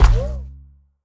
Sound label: foley land bounce; smite; odin